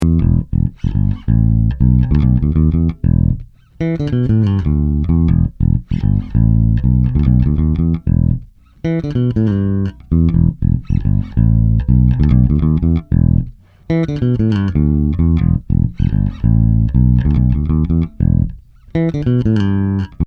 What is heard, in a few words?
Bass; Two Notes Le Bass